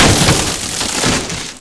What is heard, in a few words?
telepole; mtm2demo; PC Gamer 4.2